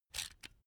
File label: electric drill template adjust regular; soundpool